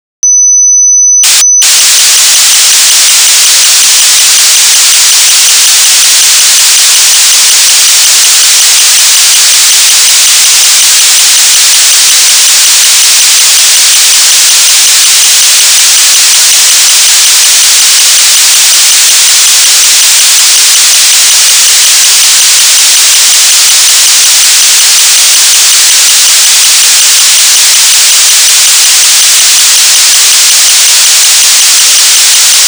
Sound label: Pedal phase dry wet, multi-dimensional effects; MULTI – DIMENSIONAL EFFECTS CHAIN PROCESSOR